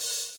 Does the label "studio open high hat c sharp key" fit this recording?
Yes